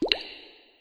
drip

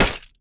food bounce

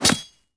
knife hit

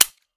bullet

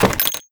ammobox

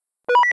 walkie talkie

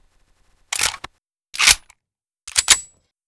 reload

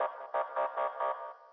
distant militsiya, ambience

